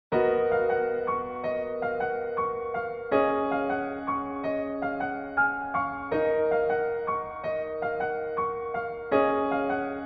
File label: Piano